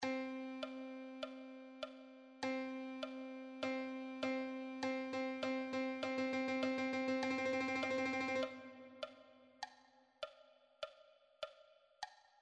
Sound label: rythm